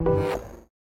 System Show; MiSide

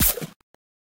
Hand Kick; MiSide

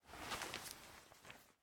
Take Paper; Game rip; MiSide

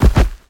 Step Enemy Boss; Game rip; MiSide

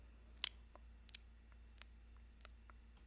Lolipop; Game rip; MiSide

